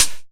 HIHAT; E-Lab; X Static Goldmine 2; akai

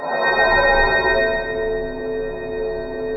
ATMOPAD; Best Service; XX Large; Pads

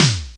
PERC; ZERO-G; Datafile

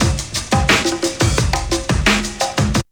BREAK

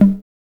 TOM

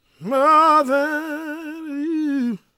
GOSP MALE, Vocal Planet Gospel

